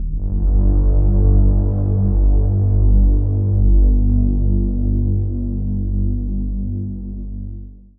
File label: Steampipe Darkpad E2